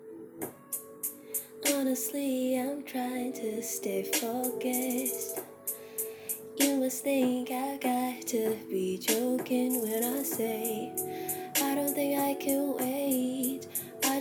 sick sapphic beats; calling out gendered double standards

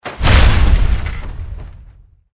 Liberty Prime foot

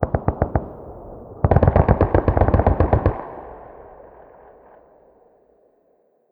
city battle

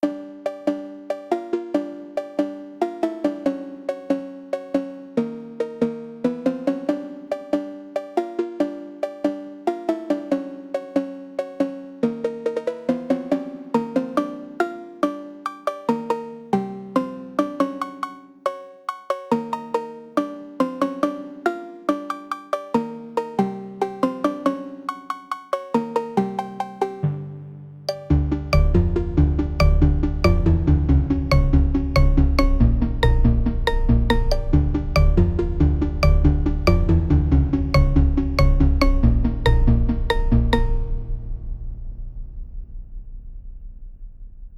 Cowbell Factory

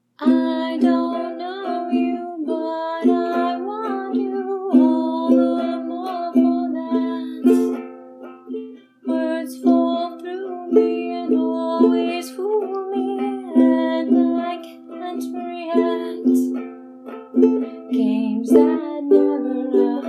cover singing, me badly playing ukulele

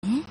Breath; Lewd SFX

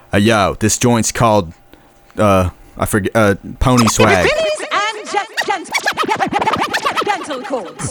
Intro Section, Vocal Stems, 120BPM; Pony Fan Music Remix Stems

